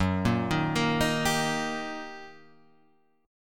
G Minor Major 7th Sharp 5th, Gb, guitar chord

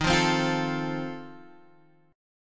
F 7th D sharp

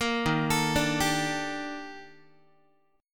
D sharp Major Sharp 11th D sharp Guitar, chord; D#M#11; arpeggiated; 13,11,12,12,11,x; 4A,4E,5A,5D,5Gsharp,6Csharp; chord shape

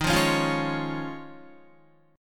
Eb 7th Suspended 2nd Suspended 4th Eb, Guitar; strummed; 11,8,11,10,8,8

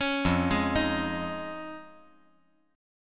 F Minor 7th Sharp 5th

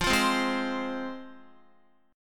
F Minor, down, Guitar; strummed; JGuitar

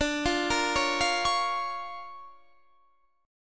D Minor Major 7th Sharp 5th, D, Guitar, Standard; 22,20,20,18,18,21; DmM7#5 arpeggiated; JGuitar

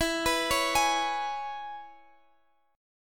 E 6th, down, Guitar, Drop D

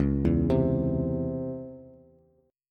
C sharp Minor Major 7th C sharp Bass, chord; arpeggiated; x,4,2,5

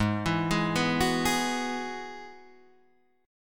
Ab Minor 7th Sharp 5th Ab, Guitar Standard; arpeggiated; 4,7,6,4,7,4

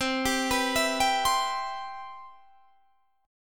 C Major 7th C down

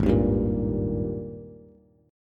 Ab Major 7th Suspended 2nd Ab Bass; AbM7sus2; 4,6,5,3; strummed; chordshape; JGuitar

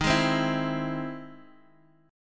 E Augmented Major 7th D sharp

